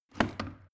plastic water boiler lift up hard; maschinen; wasserkocher

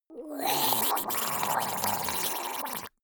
monster die gurgling high; kreaturen

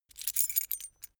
key ring mid size pick one; objekte; schluessel

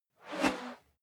whoosh whistle slow

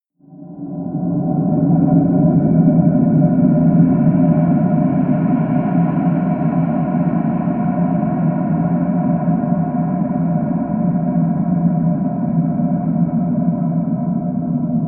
drone noise high

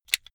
car flip key eject hard

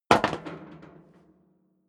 step sneaker metal rattle jump; schritte

